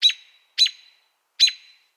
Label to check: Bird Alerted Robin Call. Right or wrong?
Right